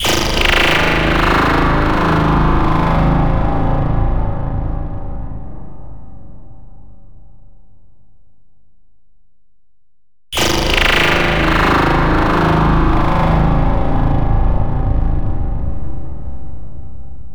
Impact, Dirty

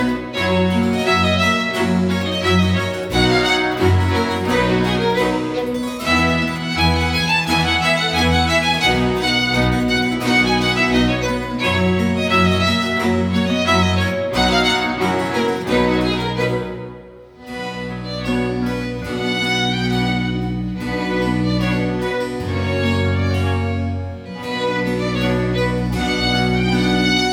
Hungarian dances from Nagyszombat C major

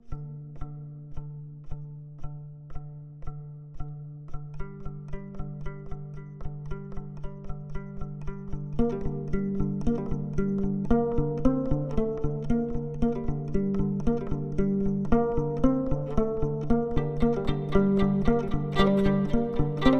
crescendo

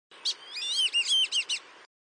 Black bellied Whistling Duck

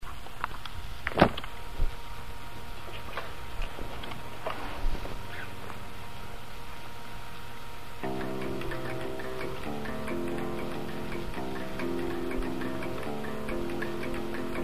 bass practice

